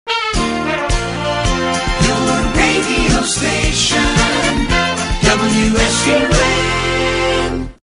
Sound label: jingle Your Radio Station; WSUN